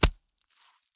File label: Gore Splatter Splat